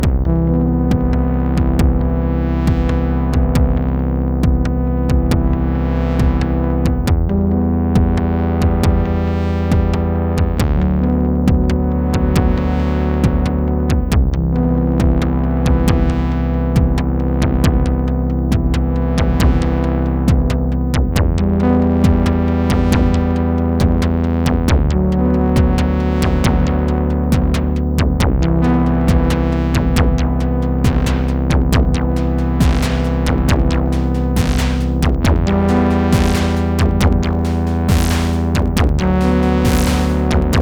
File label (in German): SOMA Metaconformer drei Mono Synths Behringer Edge